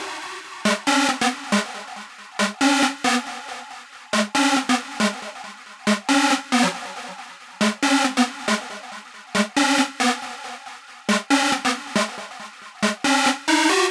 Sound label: Mainlead 138BPM WET, G# minor; Melody & Synth; Vengeance Samples; Manhattan Kit